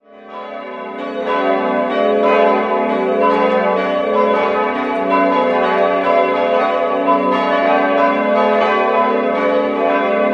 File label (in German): Elbersroth, Glocken, Pfarrkirche; St. Jakobus d. Ä.; Landkreis Ansbach in Mittelfranken; unweit der Stadt Herrieden